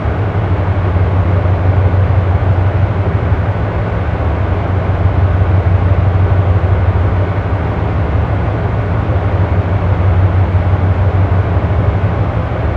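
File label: oilrig hum distant; Call of Duty Modern Warfare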